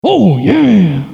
Excitement, Vocals, bowl

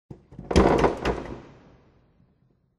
board

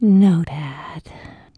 notepad; Wired for Sound Pro CD; Aristosoft, Inc.; icons; sultry

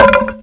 wood

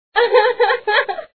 femal laff; shinobi-kun

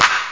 CLAP, PERC; 16,000 sample rate; 0.04 seconds; eXtended Instrument; BAS PERC

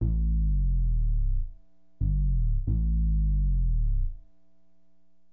BASS, HipHop; LiveAct Pool

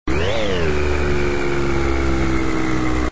motor jump; titans; robin

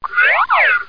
jump; How Many Bugs in a Box

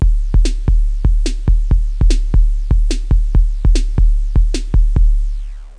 drums; instrumental

